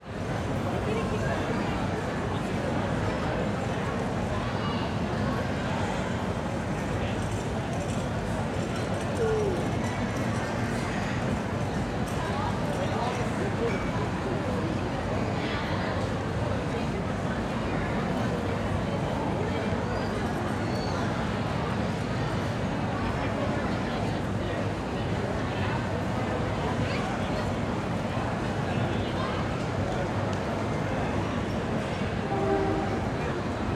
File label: Crowd, Fair, Interior, Food Building, Eating Area, Medium Distant, Thick, Wash, Ambient Fan; Airborne Sound; Fairgrounds; CNE